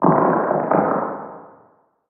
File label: Random Rock